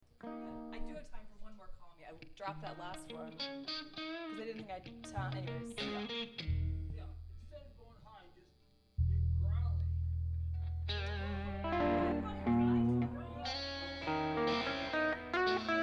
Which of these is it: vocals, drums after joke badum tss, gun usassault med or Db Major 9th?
vocals